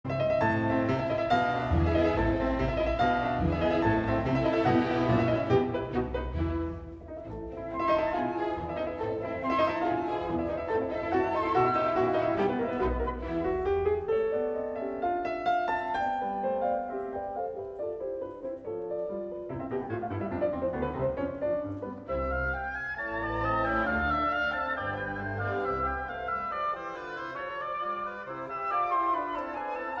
Piano Concerto

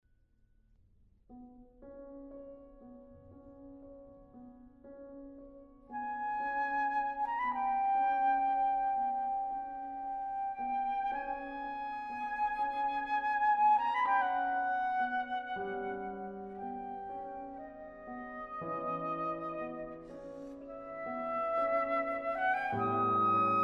Sonata for Flute and Piano

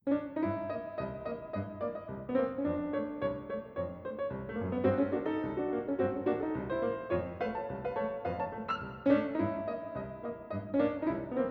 Partita for piano

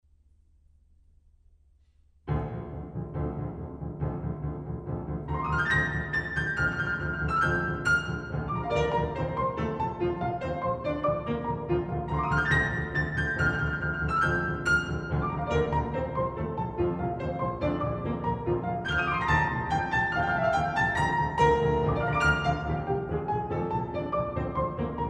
Suite for two pianos

